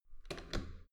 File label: metal door heavy handle down soft